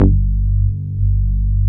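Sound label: WAVE B G1, ANALOG BASS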